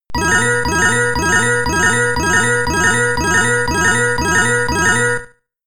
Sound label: jackpot